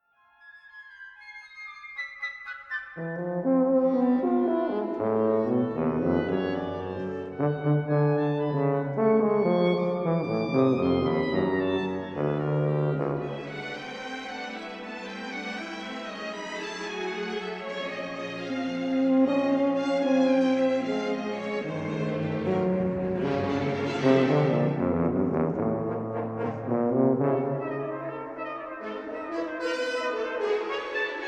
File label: Concerto for Tuba and Orchestra